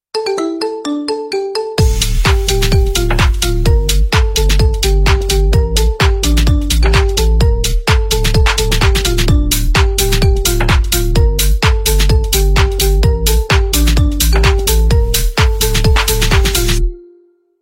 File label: Marimba